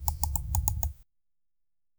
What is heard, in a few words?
a main menu button that, buttons